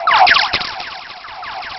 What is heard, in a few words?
loop, techno atmos